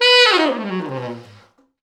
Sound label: T Sax falls, Horns Sax